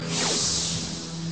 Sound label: laser; juegosdidacticos; jug-rivales